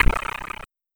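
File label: HH, hi-hat; Sonic Foundry (Sony Creative Software); Esoterik Beatz; Quesy Kit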